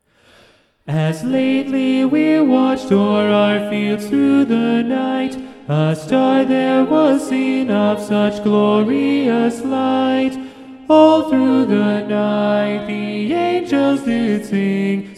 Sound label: SATB Tenor predominant; choirs